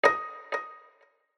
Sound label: Piano Notification; HTC; Fixed Piano; Pin Block